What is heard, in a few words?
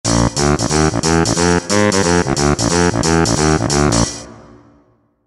Saxophone Slam; Donkey Kong 64